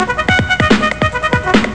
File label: trumpet play; Amiga Soundtracker Sample Packs